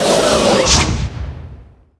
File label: Blood Flower Wide